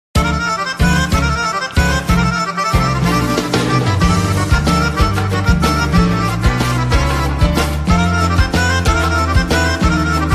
Accordion

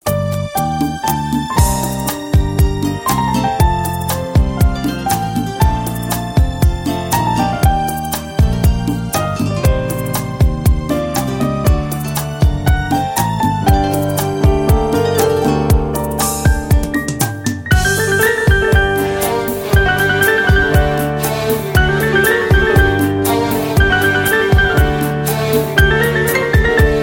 Accordion

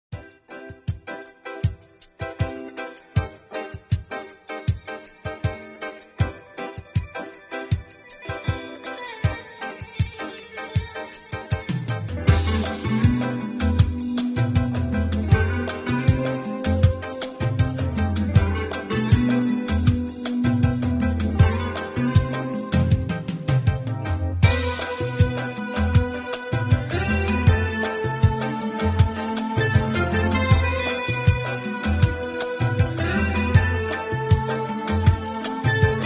Guitar

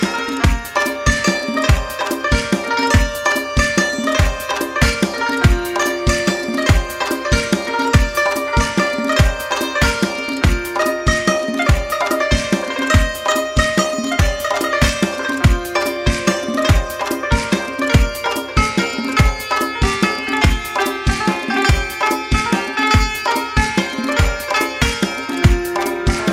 Santur

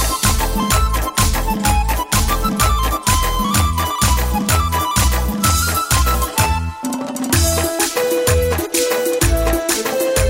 Melody

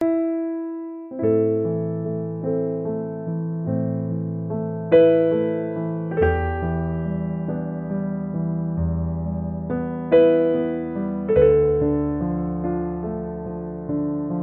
Piano

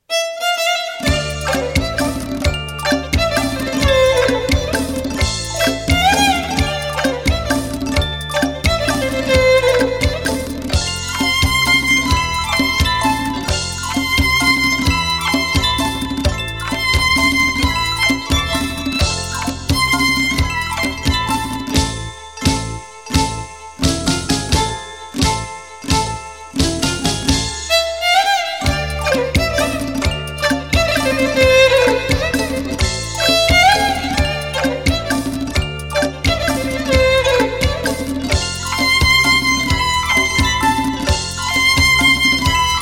Violin

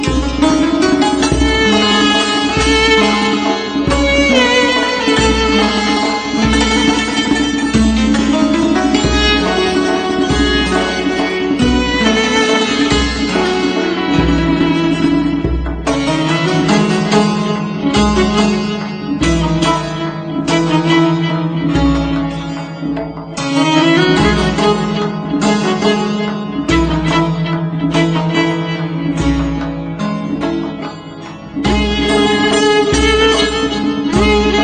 Violin

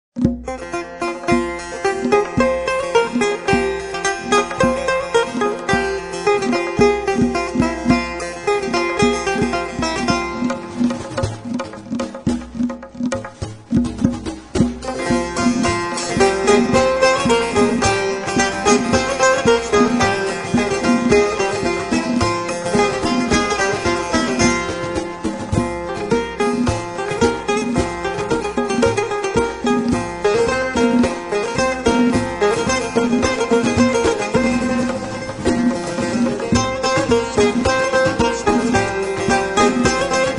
Tar

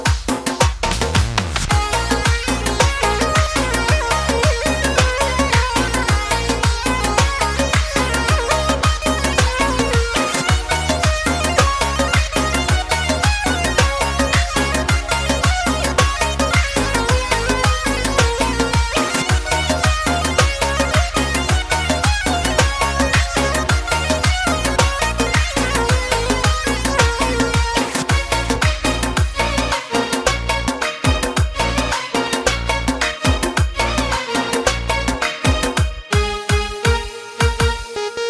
Melody

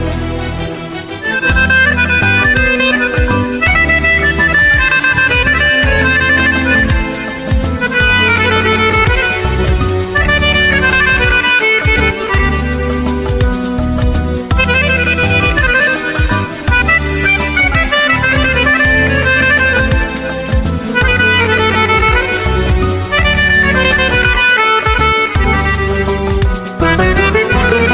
Accordion

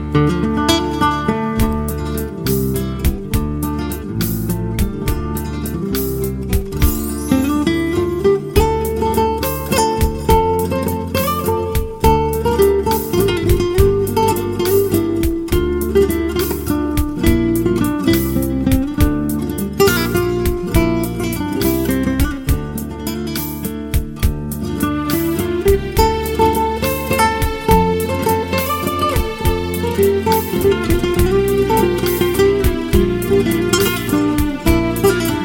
Guitar

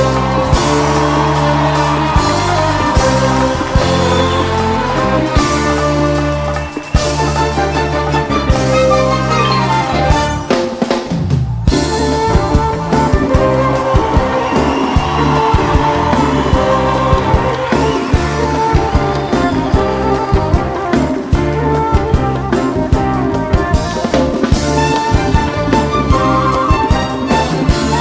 Melody

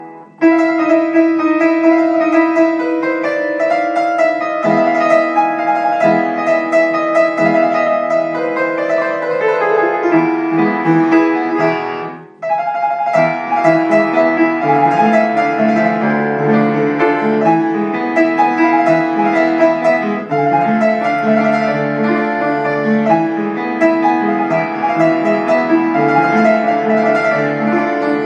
Piano

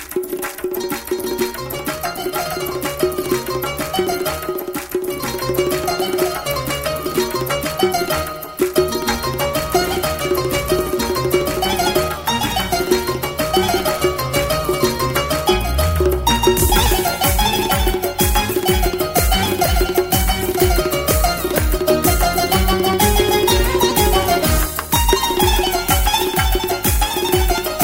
Melody

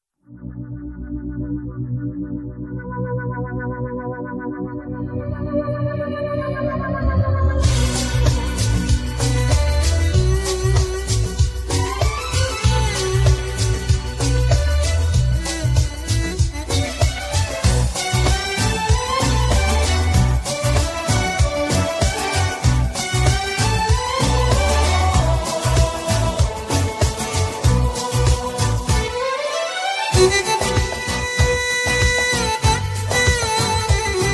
Violin

